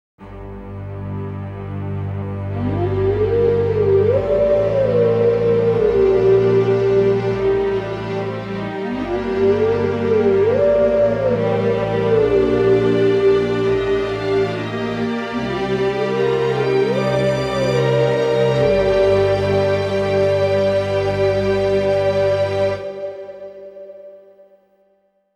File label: Howling Duet; Legend of Zelda Series; Twilight Princess (GC)